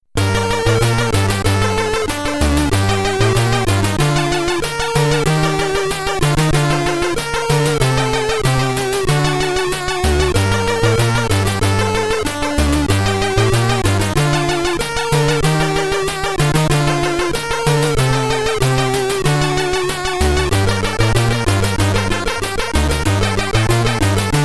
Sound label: Game Over SID Stereo; Batman The Caped Crusader; commodore 64